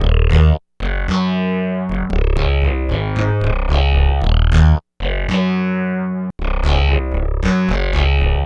Boogie Bass, Synth Bass Loops; Sonic Foundry (Sony Creative Software); Groove Elements

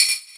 EQ Perc, Percussion Mix

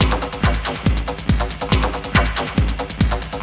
Tom Pattern, Drums Beats

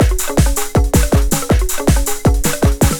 LOOP, Eurotech; Sounds Good

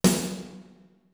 AMB SNARE, DRUMS; Greytsounds VOL-1; T1 DRUMS

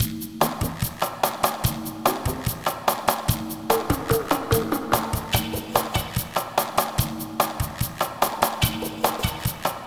Jungle Vill Perc, Lounge Drumz

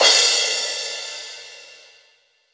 Crash, Cymbals; Roland BK-5

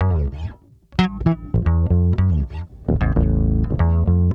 Bass Loop, Ambient Grooves

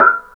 MOD HAT; East West; Phat & Phunky

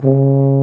BRS F TUBA; Roland L-CDX-03

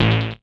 Wobble Bass; Big Fish Audio; Old Skool House; OSH Bass

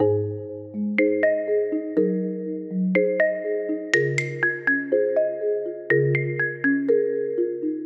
Marimba, G#maj 122BPM, Music Loops; 99Sounds; Monosounds; PANDORA BOX!; Beach Season

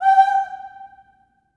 STACCATO G5, Symphony of Voices; Spectrasonics

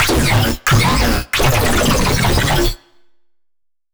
Blank neuro, bass

